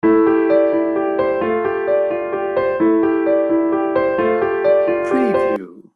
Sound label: dreamy elegance loop